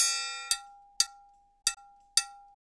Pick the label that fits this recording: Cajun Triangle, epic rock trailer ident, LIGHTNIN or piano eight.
Cajun Triangle